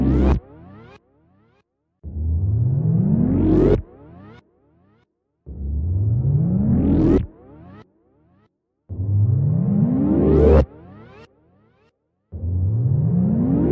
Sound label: Gravity Dn